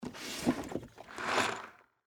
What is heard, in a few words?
Game Box Up, Board, Pick; Sports; Board Games; BlastWave